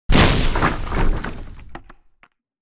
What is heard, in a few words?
Small explosion wooden structure debris